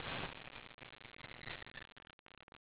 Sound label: Air, Train; TW6000